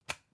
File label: usb port metallic out hard